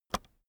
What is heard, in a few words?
keyboard external space release soft, computer